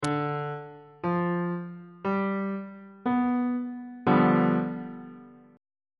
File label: a double flat dominant 7th 2nd inversion; bass clef